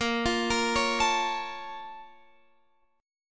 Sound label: Bb Minor Major 7th, Guitar, Open F; BbmM7 arpeggiated